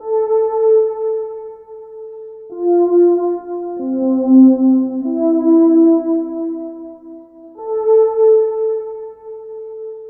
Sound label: Fresh Flutey, Synths; Sonic Foundry (Sony Creative Software); Modular Electronica